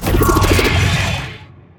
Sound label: activate; smite; poseidon